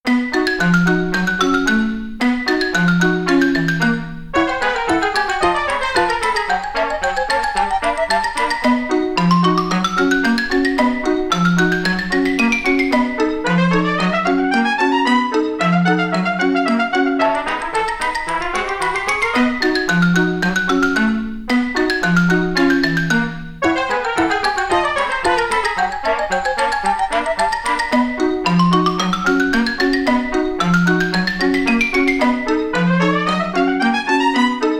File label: panic; itazura